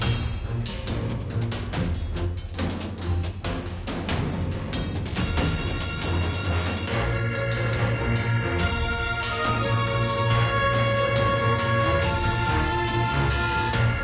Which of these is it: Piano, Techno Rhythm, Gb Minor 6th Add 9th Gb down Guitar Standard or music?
music